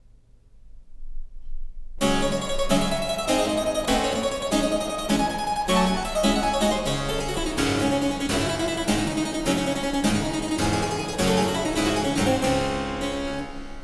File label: Keyboard Sonata in D Minor